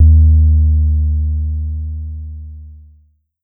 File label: Pollard Syndrum Sub